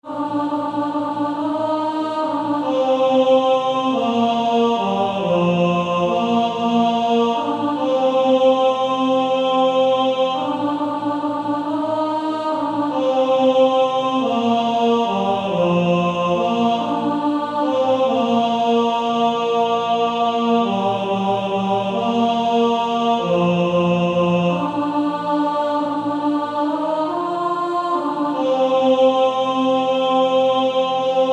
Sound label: Male Choir; Only a step to Jesus